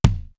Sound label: POWER, TOM; East West - Global Sounds; D4 BD SD TOM